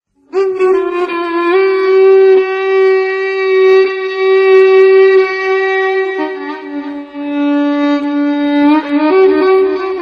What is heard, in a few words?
Saz Va Avaz Mokhalef Segah